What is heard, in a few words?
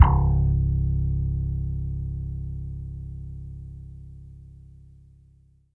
SLAP G2; Greytsounds; Scott Peers Sound Engineering